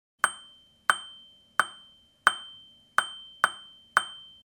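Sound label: metallic clang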